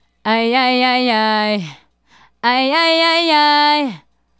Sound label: Female Solo, Vocal